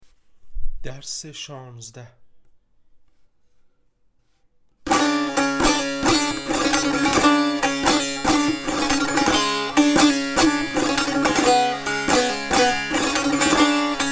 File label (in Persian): مشق تنبور; تنبور جلد دوم; هفتاد و دو مشق تنبور